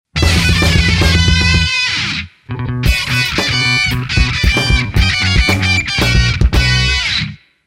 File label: Castlevania Death, 8bit